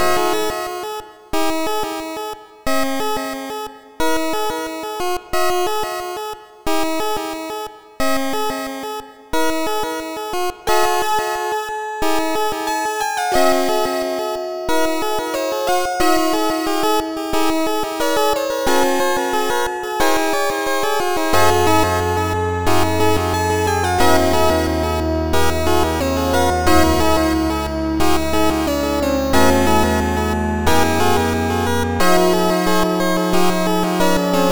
delayed chips

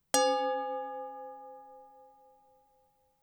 glass bell

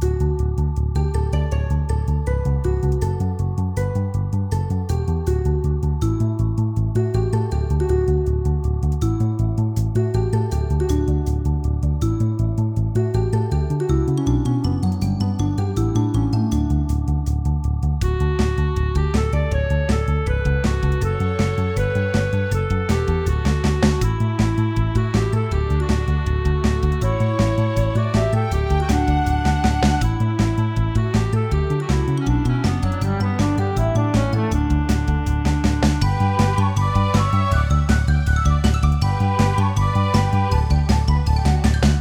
Town Theme Single File Loopable

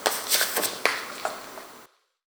steal

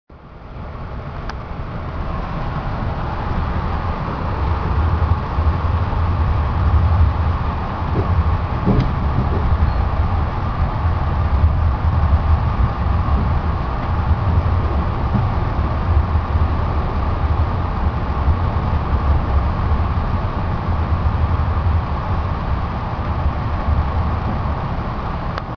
background ambience

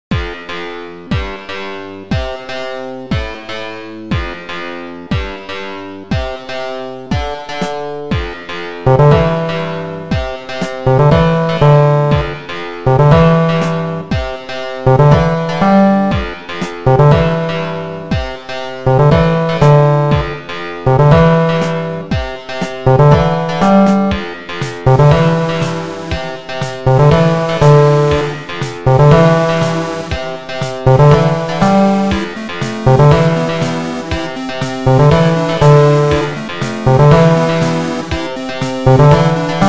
bit bot, Chiptune; chipville

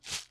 pickup; Pickup/plastic Sound

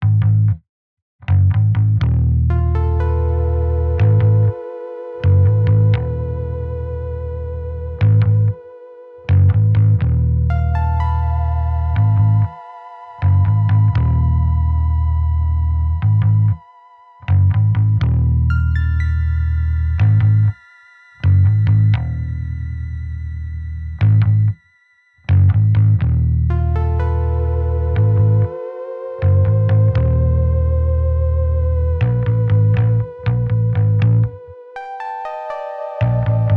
Cave theme

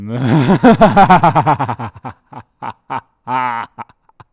evil laughter

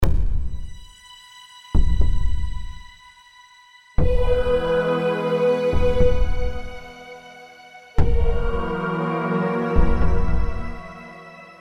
motivating ambience

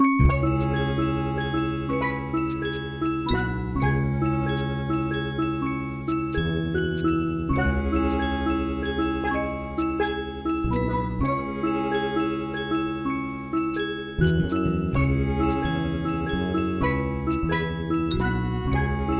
jungle excessive

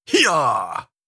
effort grunt male

